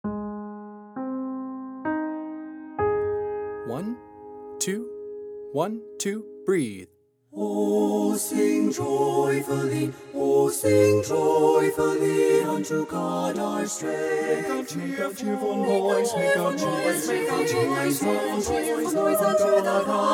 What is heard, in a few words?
o sing joyfully soprano left, choir